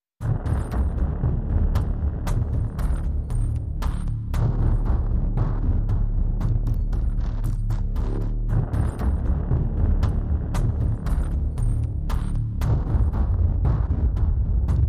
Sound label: Dread, No Choir